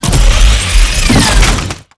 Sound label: Drone Missile Death; blue archive